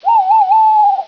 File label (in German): waldkauz; Poznan